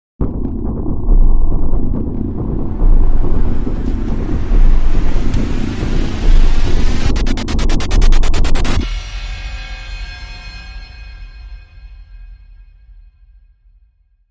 Crime Sting, No Strings

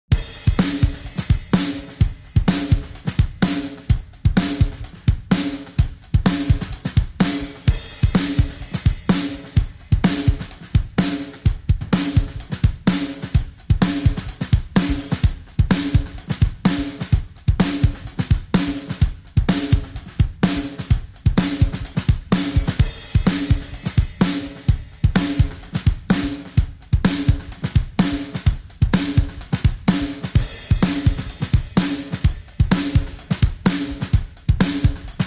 Kit Only

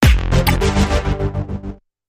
Synths, Sting Sub, F

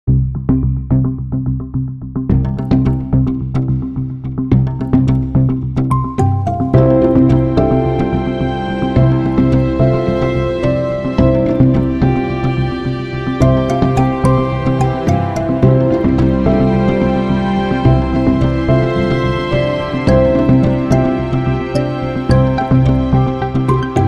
No Perc

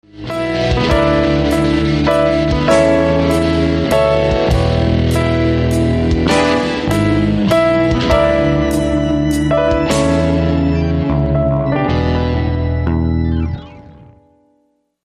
Royal Blues

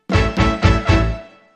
Hit

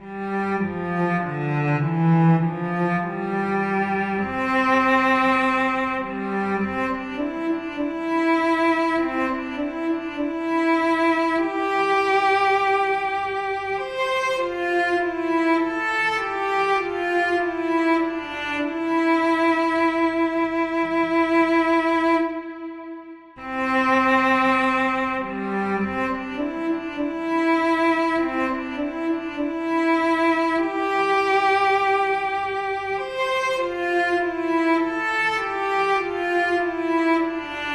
Cello Only

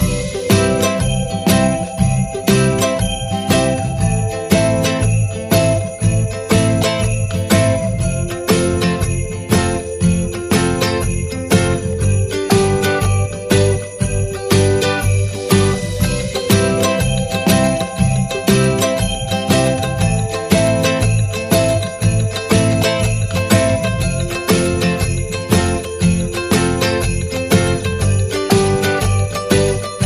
Happy Christmas Ukulele, Loop

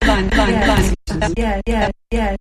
Sampled Voice Percussion Variation

